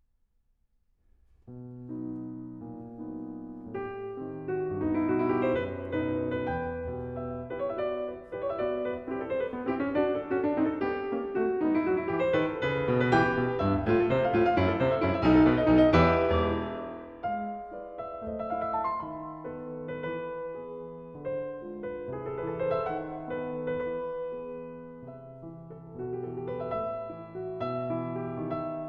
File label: Allegro, ma non Troppo, Piano; Poetic Tone-Pictures, Op. 3 I.; Grieg Complete Piano Works